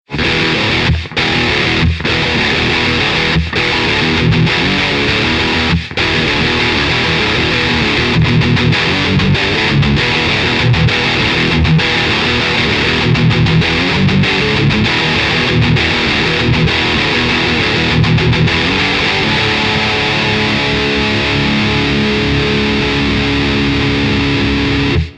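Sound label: heavy guitar; rock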